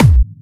Clubby Kicks, Bassdrums

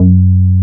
FAME F#2, BASSES

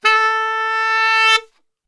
A 2 SAX; Best Service; Brass Super Section

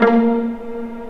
Vla Piz A#2; Digital Sound Factory Virtuoso

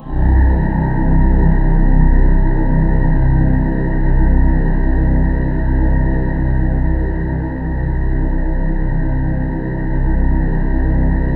C1, Synth Leads

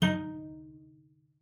CELLO FINGER D#4; 90 s Sample CDs; Xsample VOL-5

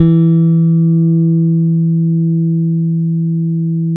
FBASS, Electric Basses; PlatinumPhattCD2; E-MU Producer Series Vol. 8 – Platinum Phatt

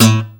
GUI Pull A2 Akus; Best Service Gigapack 2

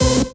RAVE, RAVESYN

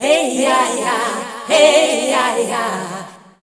FEMALE CHOIR, Dance Vocals

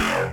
Reese design, bass

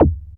kicks; FutureRetro FR777

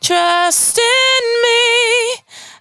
92bpm Bb, Velvet Vocal; Zero-G

